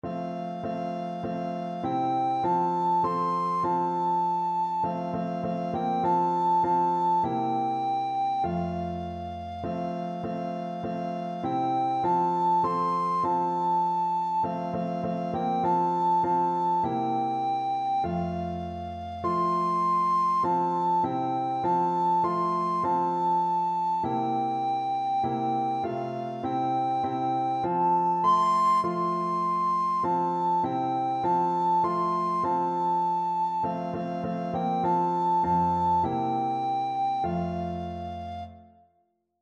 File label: blood of jesus recorder